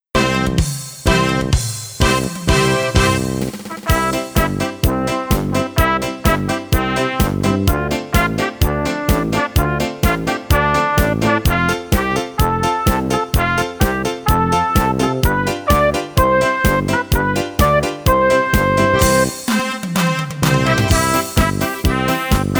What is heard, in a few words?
karaoke